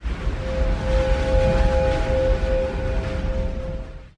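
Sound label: kraken leg attack, monster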